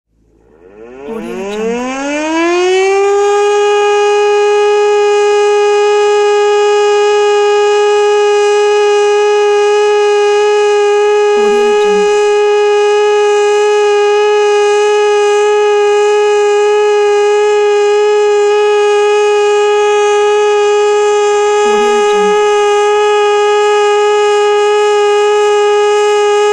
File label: war alarm